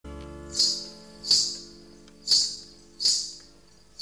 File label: dance bells